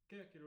Piano A

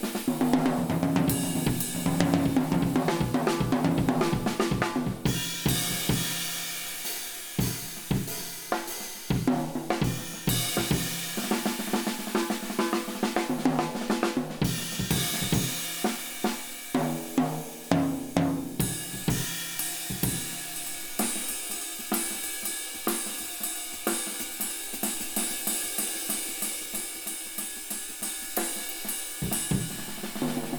Kit

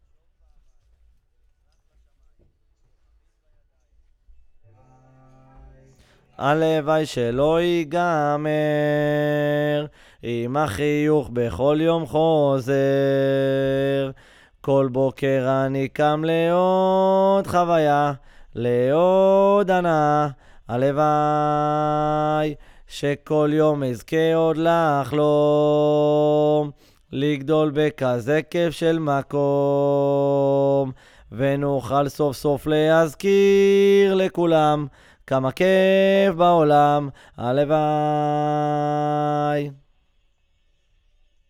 VOC GUIDE

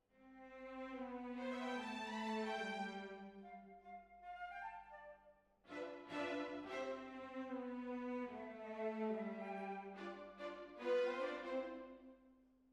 Hall

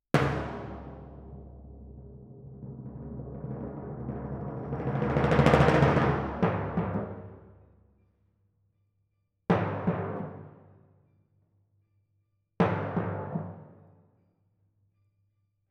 Twin F Timp

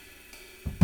Room A

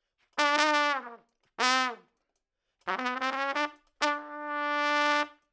Trump

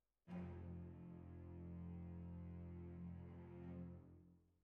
Violins, jazz for piano

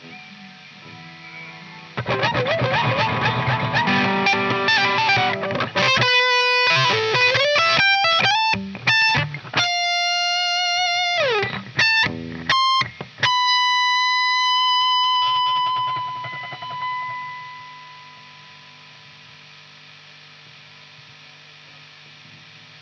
Chorus Gtr

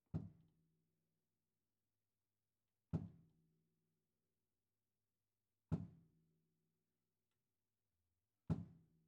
Ride

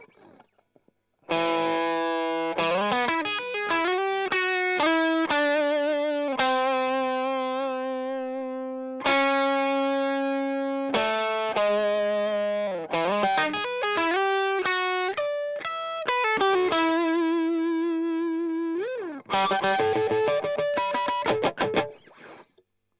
Strum Line

